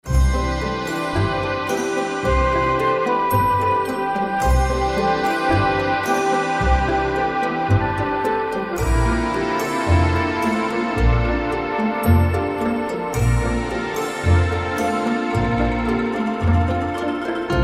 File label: Underwater